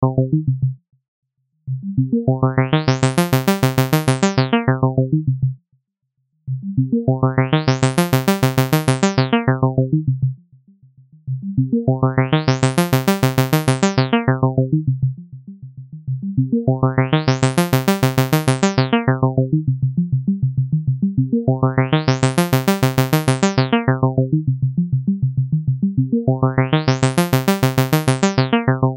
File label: low harmonics protection